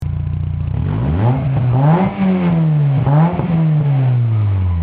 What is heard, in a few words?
First run, westfield